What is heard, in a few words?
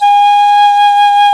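PICCOLO; Roland LA; ORCHESTRA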